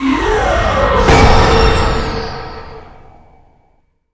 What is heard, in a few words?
Neutraliztion